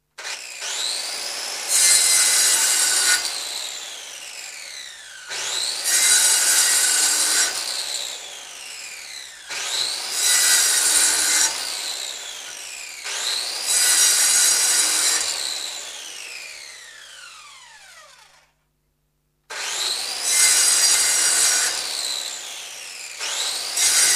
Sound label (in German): Schleifmaschine; Industrie